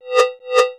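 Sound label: cowbell, drums; CMP - Analogon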